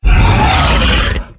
Exertion Heavy, Behemoth; Evolve

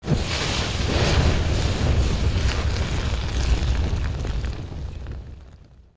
Flame Sizzle Swt; Evolve; Meteor Goliath

